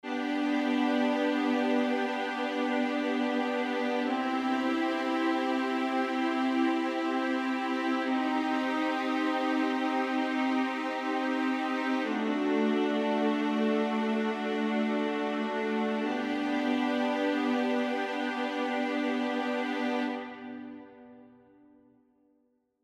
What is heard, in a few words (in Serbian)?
akordi gudaci dur